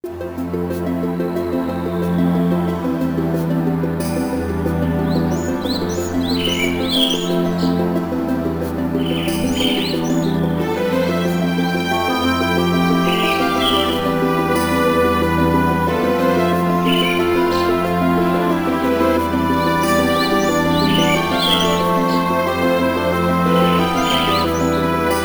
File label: Forest Cave (With SFX); Dokapon Kingdom; Wii